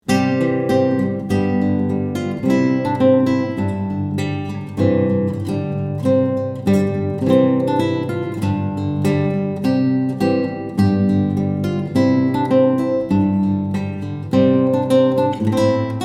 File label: trumpet tune